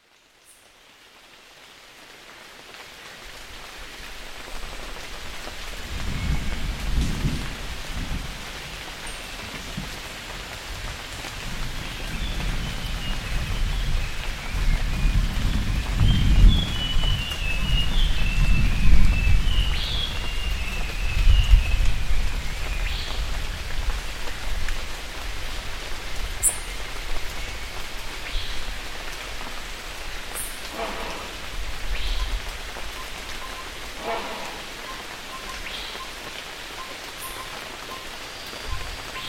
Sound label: hornbills and gibbons; soundscapes